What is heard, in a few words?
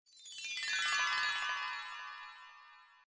twinkle slow